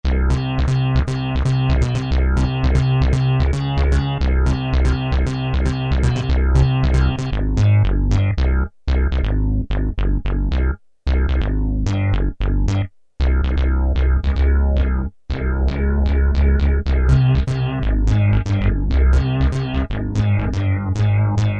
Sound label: House Bass, Kurzweil K2000; Kurzweil K2000 V3